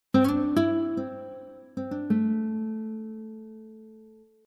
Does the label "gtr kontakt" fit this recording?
Yes